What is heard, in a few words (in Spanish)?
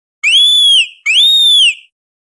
Grito de Oricorio estilo animado